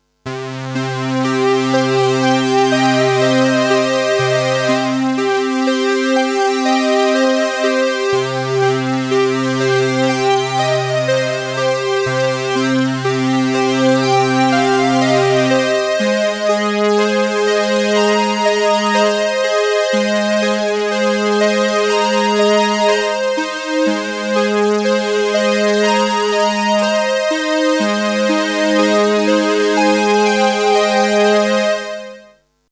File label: Boss CE-300